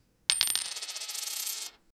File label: many coins dropped onto a, money